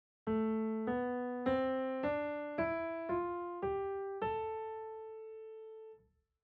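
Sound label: scale natural minor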